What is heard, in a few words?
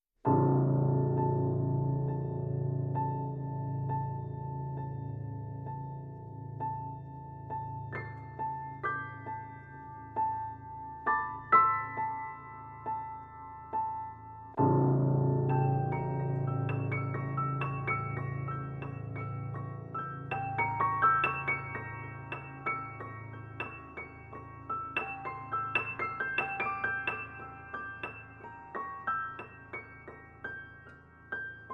Piano